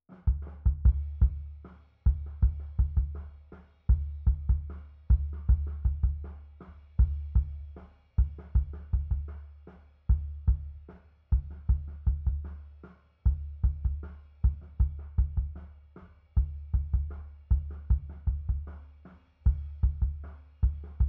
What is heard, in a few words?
Kick SUB